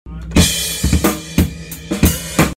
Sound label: drum; Hornpub intro